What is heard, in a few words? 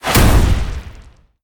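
Trap range death; monster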